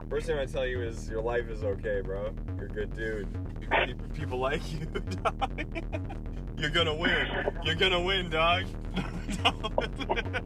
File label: 8BIT